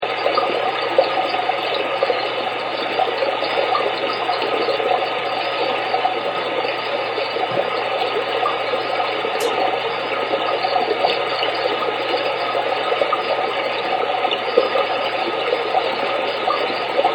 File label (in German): Bächlein